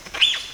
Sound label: diana, vocalisations